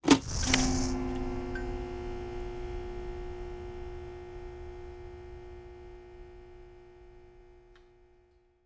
poweron; teardown; ps5 windows xbox series xs

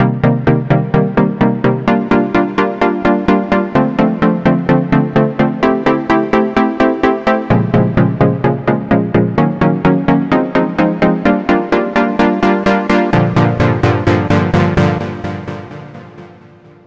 Synth Main; super crush k.o.

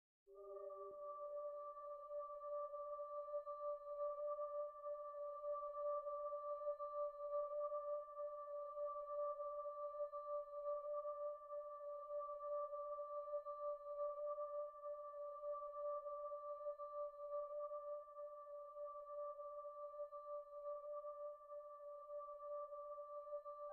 Menu Pad

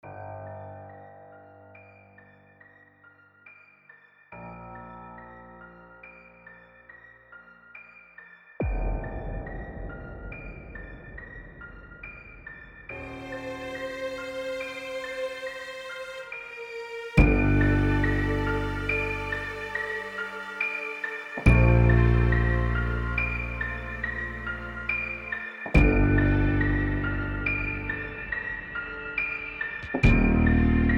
Forest Night; Wayfair Terraria

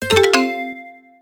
Fx Success Player; Diner; Light Buford

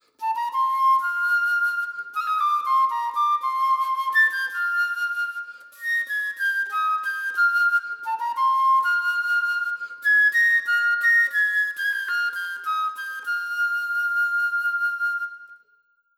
Fluty Flute; Ylands

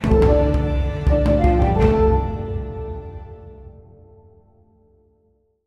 card follower; Armello The Bandit Clan

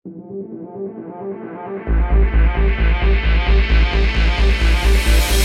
Miniboss Fight intro 132bpm; wildcat gun machine ps4 switch windows xbox one gamerip 2022

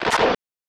Break; Bishoujo Senshi Sailor Moon; snes

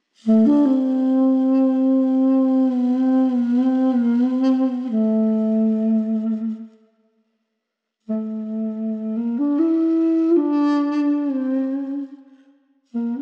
Duduk; raji an ancient epic

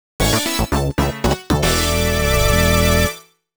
Mission Success - Low Rating; Mario Kart DS

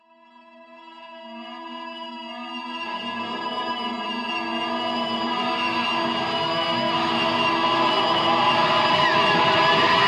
Crash Site (short mix)

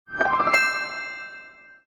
Star Earned; Cookie Jam

